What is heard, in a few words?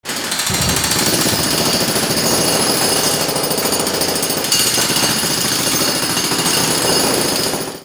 INDUSTRY